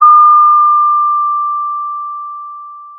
pop, Sound of a school bell

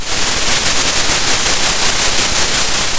pop, Sound of a thunderstorm

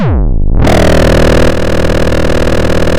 Sound of a chainsaw

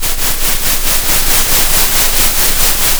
center, Sound of a train

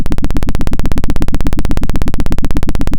pop, Sound of a helicopter

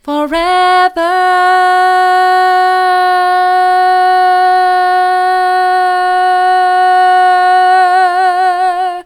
forever soft, vocal

